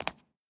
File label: concrete footstep